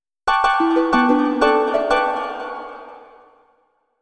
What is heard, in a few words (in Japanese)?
サウンドロゴ; マイクロソフト; 不採用作品; I.E. 4.0